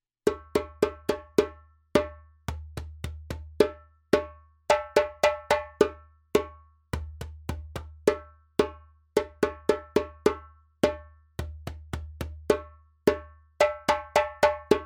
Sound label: djembe; djembebog oevelse